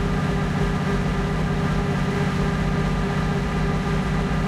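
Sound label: cromwell engine interior rpm 66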